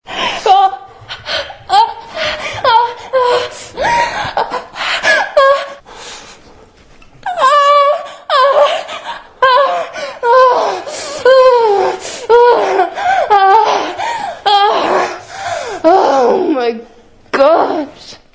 orgasmo oh my god

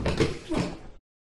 chair on wood

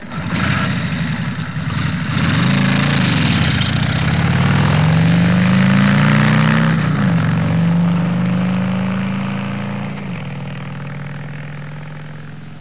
vehicle

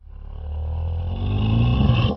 bull

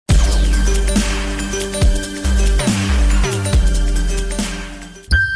ps3 sony

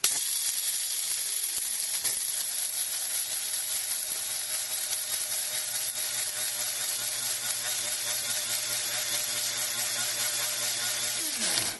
bone saw

